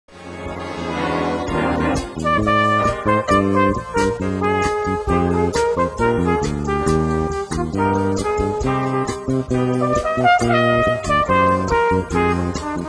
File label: Flugelhorn